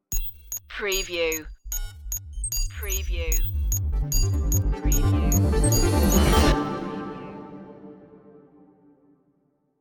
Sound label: digital clockwork stinger